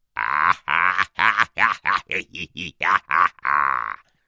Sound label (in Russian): kovarnyi smex zlodeya; Высмеивание и издёвка над людьми